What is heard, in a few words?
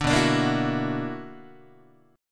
B Minor Major 11th, BmM11 chord, Guitar; strummed; 5,7,5,8,8,5; JGuitar